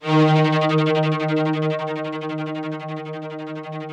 ROUGH STRNGS, Yamaha VP-1; Music Store

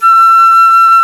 Flutes, Flt amb

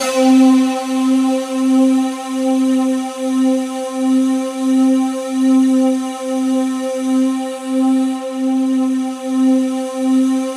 C3, SynthLine; 90 s Sample CDs; NiceBeats; SynthLine VOL-11

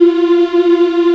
African Mm m; Roland LCDP11 Africa VOL-1

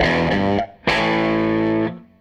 Gretsch Guitar Loop

